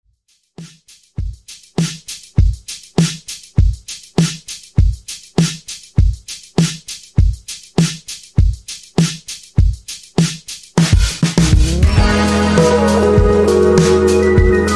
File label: 100 BPM